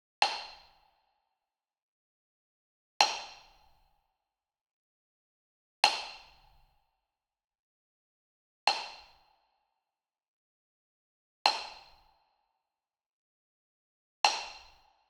WOOD PERCUSSION Wood Block Traditional Small Hit Medium; boom library; Cinematic Strikes Construction Kit